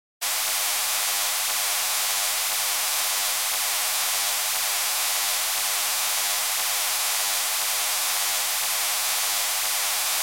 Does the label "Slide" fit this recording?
No